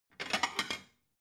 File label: plate cupboard take slide